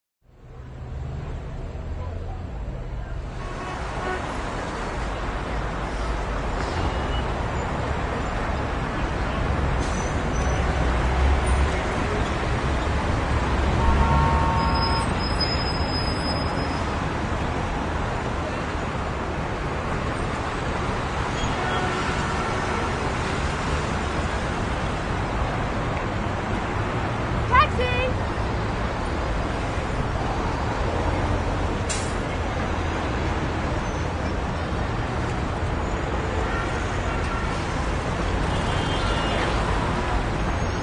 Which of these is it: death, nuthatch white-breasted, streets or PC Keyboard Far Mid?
streets